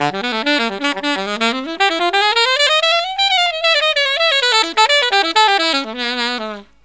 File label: SOLO SAX; USB Soundscan vol.29 - Killer Brass Riffs; AKAI